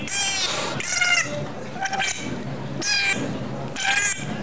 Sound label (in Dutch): tuimelaar cursing; walvisstrandingen